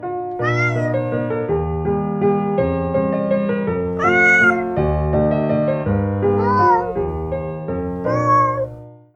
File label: Piano Cats